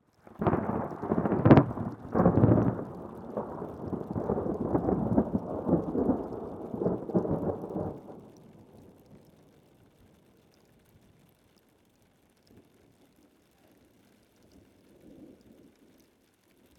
nature thunderstorm top chacaltaya mountain 5300m bolivia; felix blume